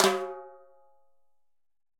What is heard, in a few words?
Timbales B H Flam; Latin Percussion; Drum Hits; Ableton; Factory Packs